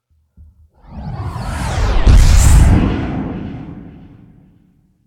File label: Passing Swoosh Exploding